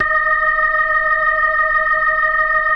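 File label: E flat 5, B3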